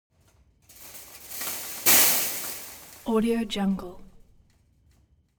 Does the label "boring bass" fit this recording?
No